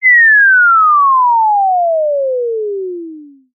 cartoon descend falling whistle simple classic; zapsplat

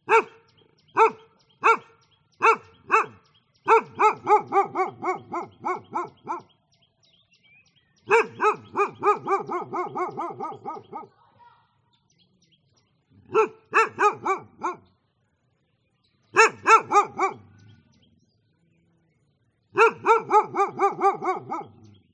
dog bark some bird noise vietnam

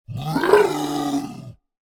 MONSTER Yeti growl

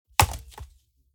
Impacts SIMPLE; soundbits

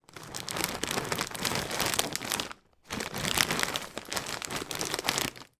food peanuts removed from pack by hand; sound effects one

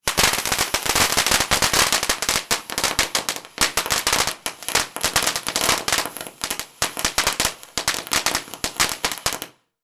firework fountain sparks crackle; sound effects one

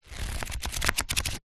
household book pages flick fast; zapsplat

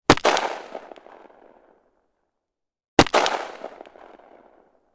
gun marlin 336; still north media

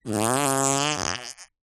cartoon fart

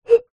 bottle beer blow air top liquid inside

